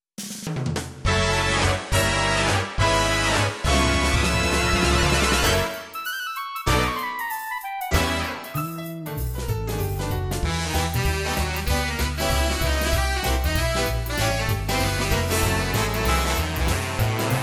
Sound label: big band